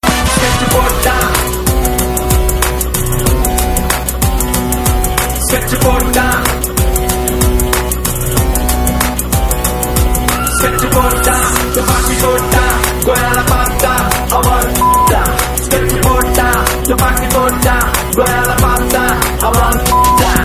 theme ringtone; tamil; sketch

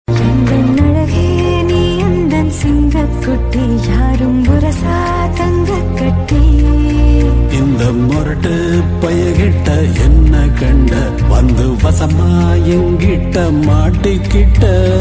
love ringtone

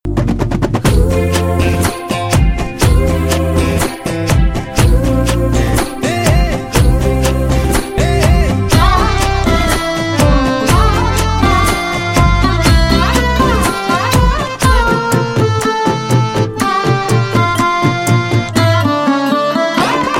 karaoke ringtone; tamil; rajini murugan

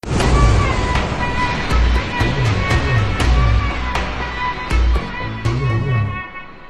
bgm theme ringtone

